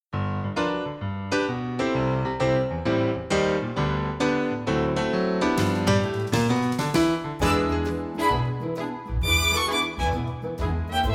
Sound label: FX Orchestra